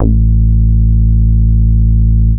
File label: long bass; SoundFactory